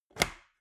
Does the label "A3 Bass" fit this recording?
No